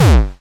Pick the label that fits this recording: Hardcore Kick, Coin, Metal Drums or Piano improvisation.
Hardcore Kick